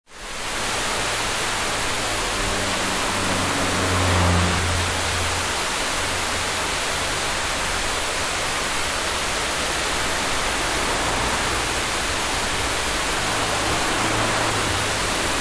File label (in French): Cascade de la montee du Chemin Neuf